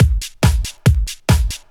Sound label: 140 House Kick Loop; Dance DJ; Drums; Kick Loops